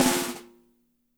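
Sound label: piccolo snare right, NATURAL KIT; Spectrasonics; Burning Grooves CD2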